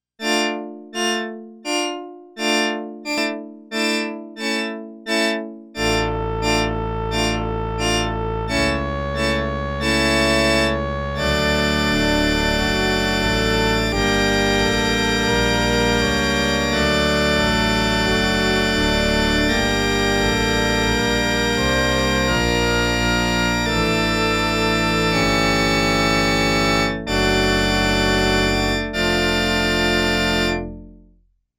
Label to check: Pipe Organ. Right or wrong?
Right